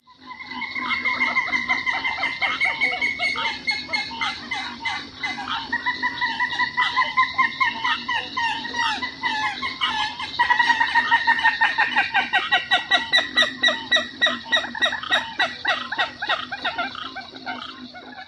group outbursts

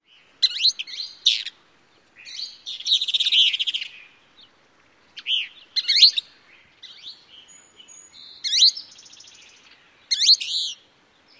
calls

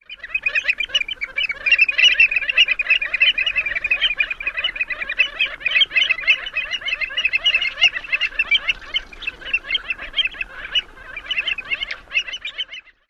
excited group calling

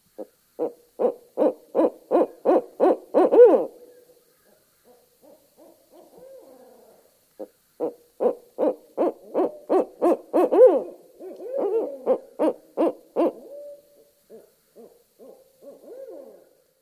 ascending hoots

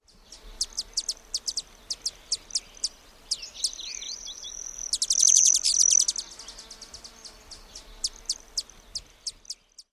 chips and trill

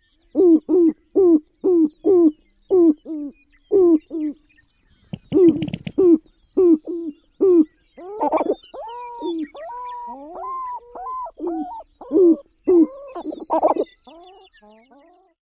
lek calls

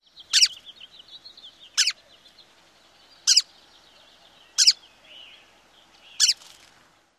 calls; AZ

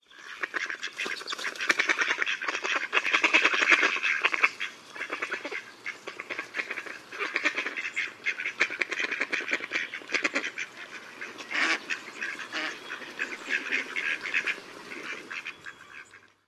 courting group

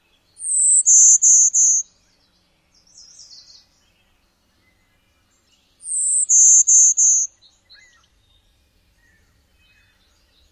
songs; NY

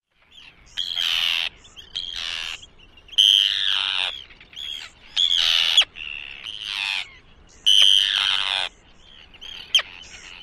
keeyarr calls

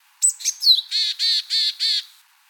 novel mixed-species grammatical call sequence; “ABC-tӓӓ” mashup; Japanese Tits

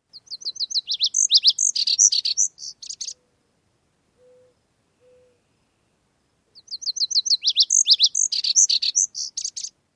song

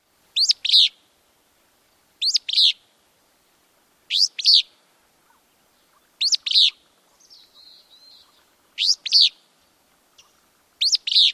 song

